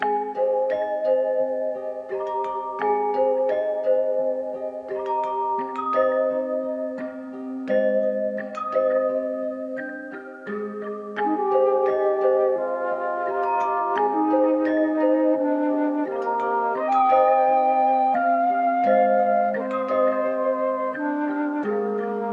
Nostalgic Melody Loop 172 BPM C# Min; Cymatics; Oracle Sample Pack

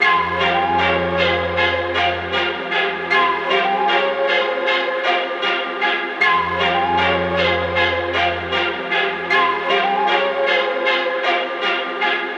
155 BPM F Min, Hip Hop Melodies, Orchestral; Domination

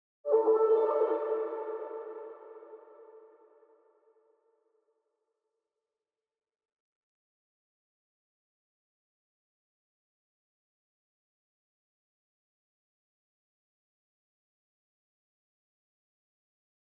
143 BPM D Min SFX; Cymatics; 2022 Melody USB Expansion; Chaser

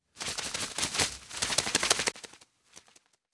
Single Bird Flapping Wing; Fairlight Sound Lib

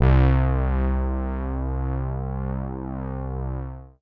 SYNTH Brassy (C); Melodic One Shots; Cymatics; GHOST (Beta Pack)

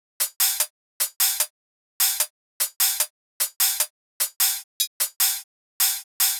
Dubstep Drum Loop Cymbals, 150bpm; Stem Seperated

